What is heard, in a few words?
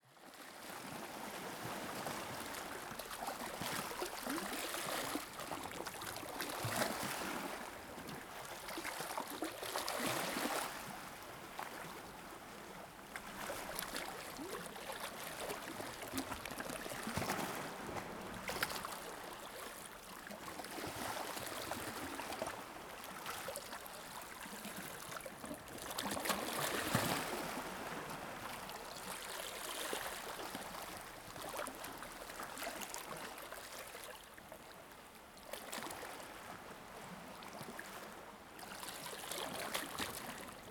Beach Calm Waters